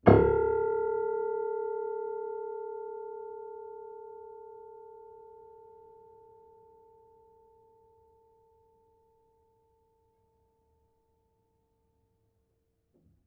Harm Low, Piano Harmonics

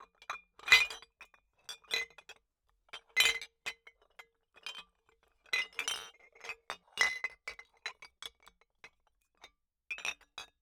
Bottle Struck

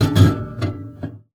Classical Guitar Extended Technique

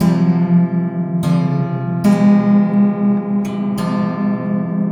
Detune, Piano; Piano Destruction

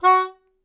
Melodica Short; Short Notes Chromatic

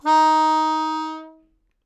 Melodica Long, Long Notes Chromatic

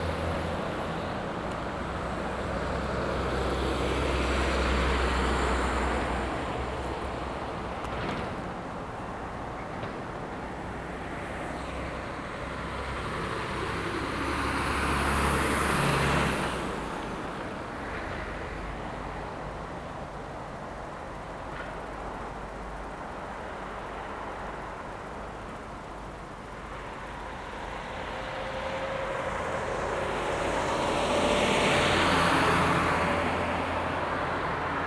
Dock Traffic Belfast; Sound Scapes

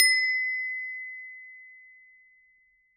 Hole Saw; Hole Saw Bits

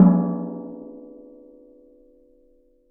Strike, Washing Machine Drum Outside

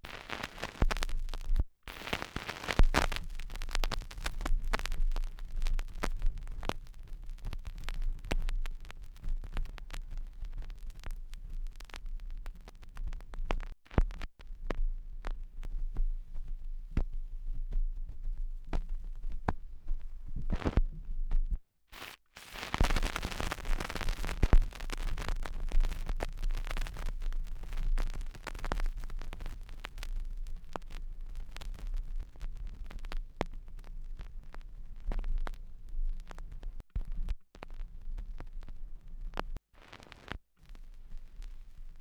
Vinyl

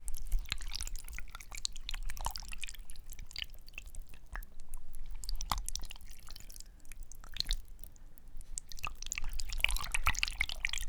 Livers Wood

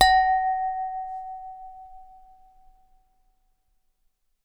Glass Clink, Beer Glasses

